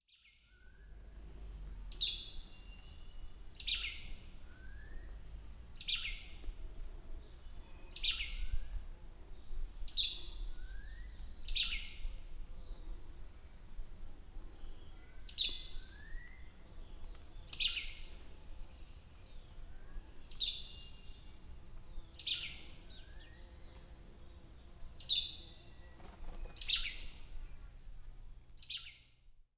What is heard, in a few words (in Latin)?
pezopetes capitalis